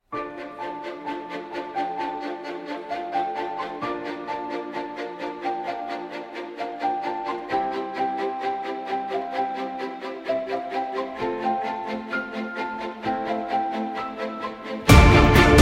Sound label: Instrumental